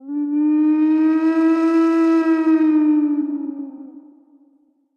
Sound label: howl; server-nalc; mobs